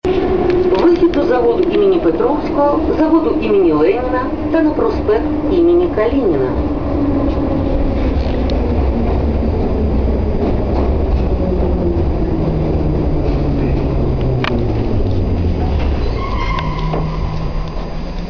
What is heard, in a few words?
metal zavod; dnipropetrovsk